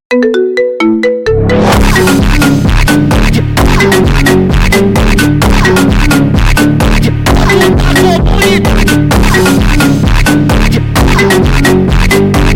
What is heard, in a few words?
remix marimba; nhac chuong; anytunz